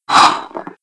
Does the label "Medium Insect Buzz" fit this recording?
No